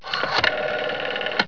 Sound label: rotary; Presentation Partner